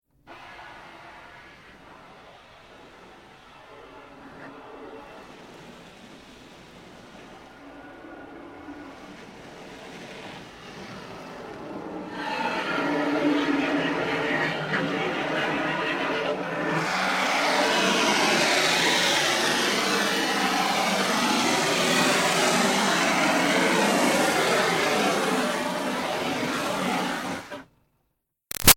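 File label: Water Spray Interior Car Hood Up Long; Car Wash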